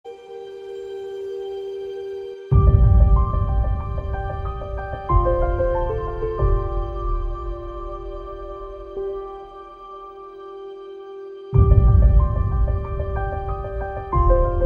trailer music; beauty and the beast

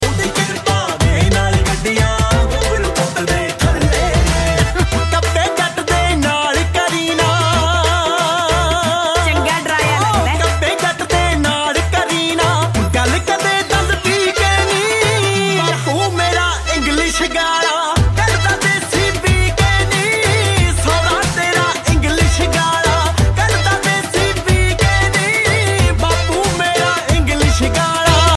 punjabi ringtone

